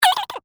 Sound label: Beep Click Button; Multimedia